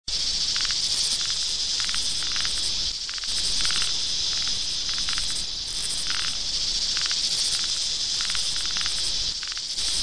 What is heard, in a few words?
Cicadas